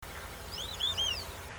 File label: marreca dendrocygna viduata